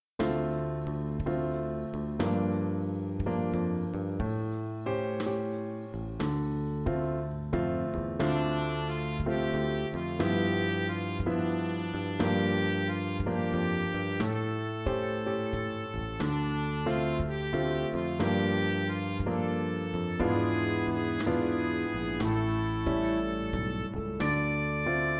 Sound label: SIMPLY JAZZ